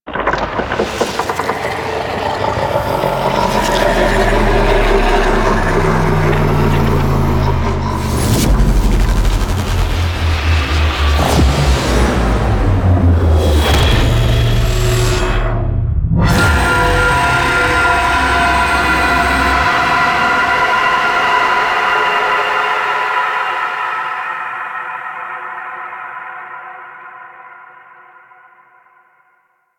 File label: Sentient Room End Sing; The New War; Natah